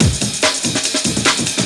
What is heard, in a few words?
loop; SoundFactory; SMPMANIA